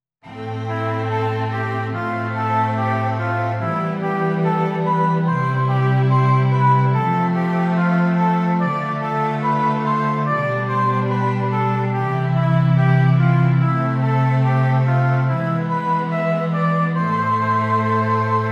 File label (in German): Stimmiger Contrapunkt; Ronge; corretto